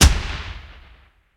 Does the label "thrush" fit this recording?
No